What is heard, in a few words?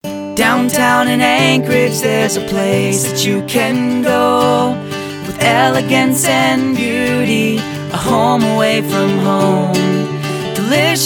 jingle